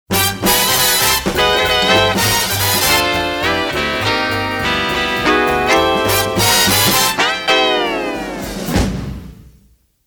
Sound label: guitar riff